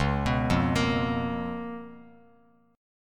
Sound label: C sharp 13th, Bass Standard; arpeggiated; C#13; JGuitar